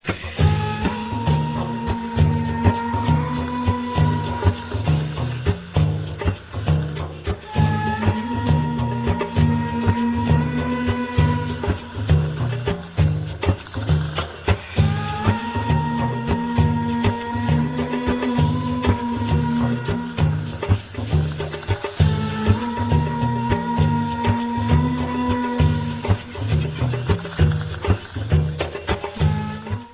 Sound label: tar groove